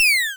laser, Retro Sounds 32-Bit

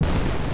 Explosion

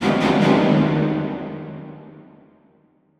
Stabs Strings Mid, horror strings

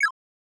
selection, 8 bit sound effects; Main menu

